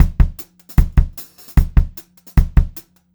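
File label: 152 speed 2 beat, pop rock drum loops; USB Soundscan vol.37; AKAI; 90 s Sample CDs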